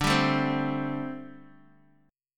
D 7th Suspended 4th, down, Guitar, DADGAD

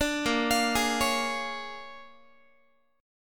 Bb 7th Sharp 9th Bb, Guitar; Bb7#9 arpeggiated

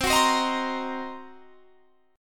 C Minor 7th Flat 5th, chord; Cm7b5; strummed

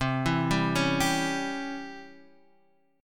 C sharp Minor Major 7th Flat 5th C, guitar chord

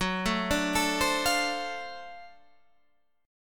D 7th Sharp 9th Gb

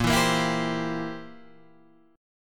C 7th A sharp

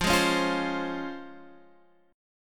C sharp Major 7th

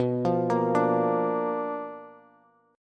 B-Minor Major 7th Flat 5th B down Bass; BmM7b5 arpeggiated; JGuitar

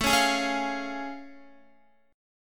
A Augmented Major 7th A Guitar; strummed

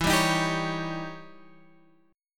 E Minor Major 13th E Guitar Open G

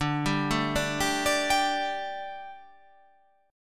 D Suspended 4th D, down

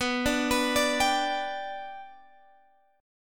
B Minor Sharp 5th, Guitar, Open G

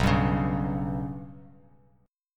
B Suspended 2nd Sharp 5th down Bass Standard; Bsus2#5 strummed; JGuitar

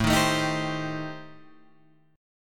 A-Minor 7th Flat 5th, Guitar Drop D, chord

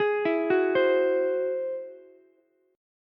Ab Augmented 7th Ab down Ukulele; arpeggiated; Ab+7; JGuitar

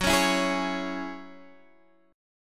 F sharp 7th Suspended 2nd; F#7sus2 Chord {X 9 11 9 9 9} strummed

